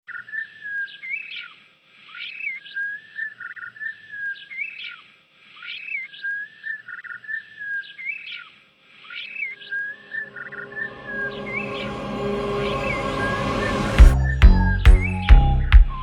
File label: bending birdbeats, psytrance